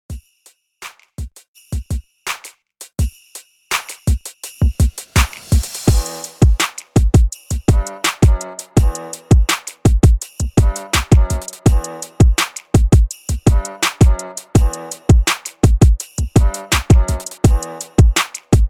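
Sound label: REDRUM INSTRUMENTAL 83 BPM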